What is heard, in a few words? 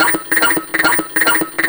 Tracer Rhythm; Sonic Foundry (Sony Creative Software); Loop Noir